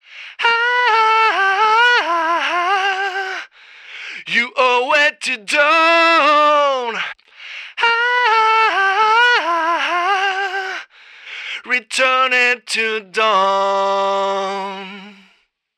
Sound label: Mic Room 87 To Telephone